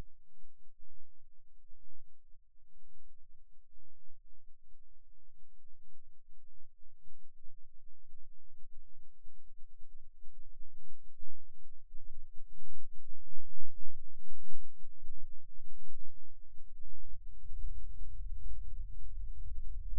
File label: Rhythm Seizure, eeg